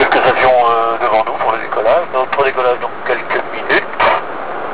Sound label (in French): attente decollage